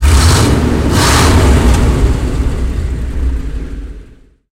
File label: m5a engine load, tanks; War Thunder